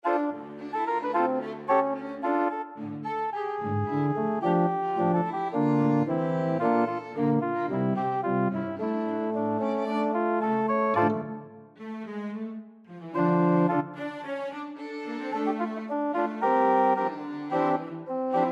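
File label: Medusa Tango trio arrangement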